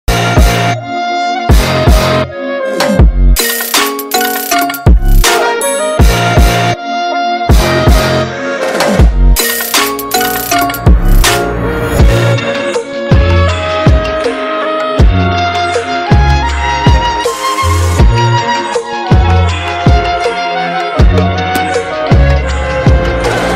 bgm ringtone instrumental

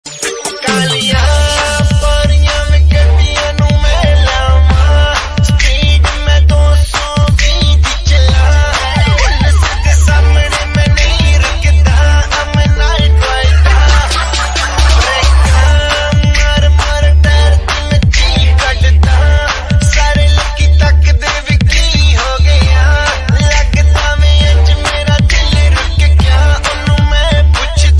remix ringtone

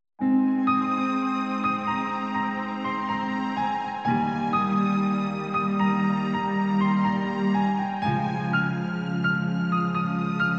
emotional ringtone piano cover

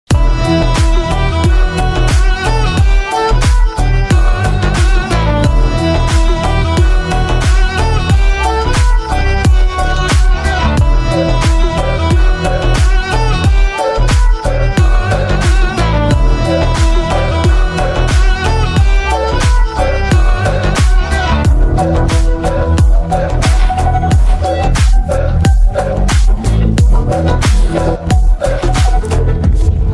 action bgm ringtone; Devara; NTR; man of masss

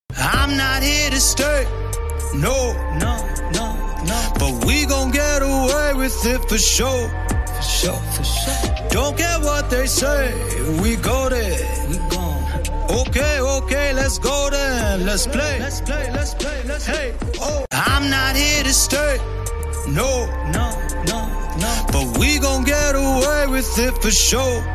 villain ringtone bgm; pathu thala movie

